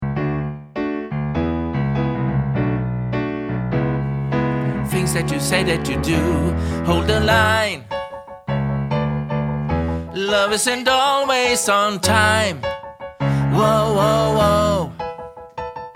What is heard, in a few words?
TENOR